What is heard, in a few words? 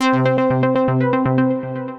Modular Bass Arp, Synth Rhythmic; Ableton; Factory Packs; Synth Essentials